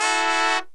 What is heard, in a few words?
TRUMPETS; Greytsounds VOL-1